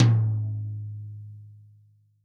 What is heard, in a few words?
REAL DRUMS POP KIT